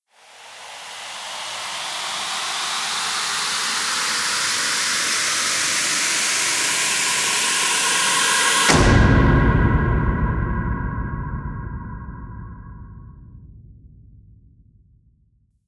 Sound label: Noise Attack, Sound Design Trailer Tools; Gothic Storm Music; Brain Warp (Sound Design Trailer Tools)